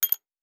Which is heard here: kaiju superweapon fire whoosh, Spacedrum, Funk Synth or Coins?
Coins